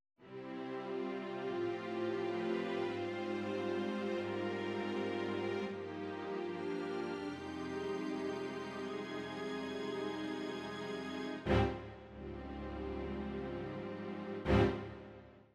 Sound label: Roland Fantom XR Strings